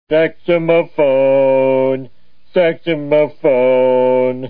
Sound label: sax; homer